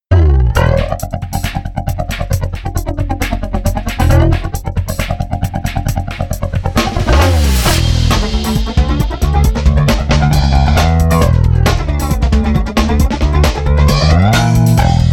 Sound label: me-20b patch; ME-20B Bank 2, No. 3; Flanger sound that works well with picking.